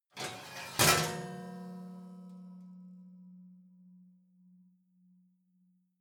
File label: oven baking sheet draw out